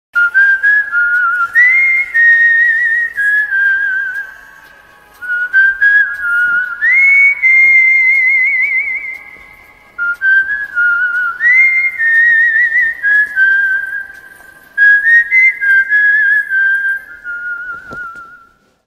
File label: Whistle Ringtone; Shah Rukh Khan